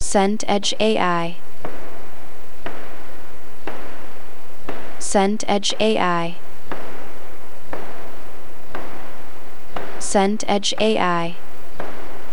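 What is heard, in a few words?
ambience train station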